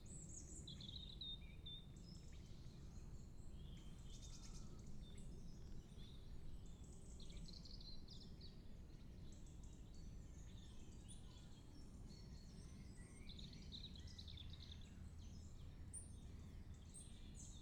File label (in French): oiseaux; dulac; Le retour d'Ulysse